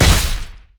basicattack; Yorick

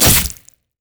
crit attack; Zed

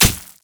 basic attacks; quinn

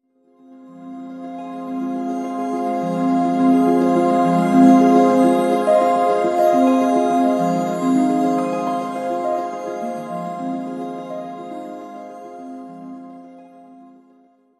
hymn of valor melody; sona

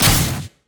spiritrush; LoL; ahri

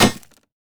vs metal; LoL; jax

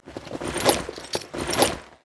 emote dance loop; LoL; jinx base

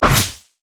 steam golem mana barrier hit; LoL; blitzcrank

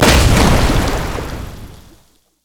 explosive cask boom; LoL; gragas

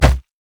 Crit Attack; LoL; DrMundo

